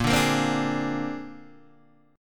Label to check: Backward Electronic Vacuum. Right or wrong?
Wrong